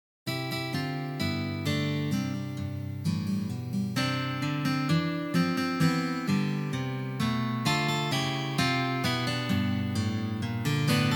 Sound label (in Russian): na gitare